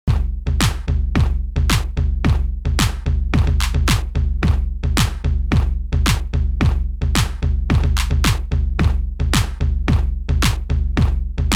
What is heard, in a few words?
Static TR Style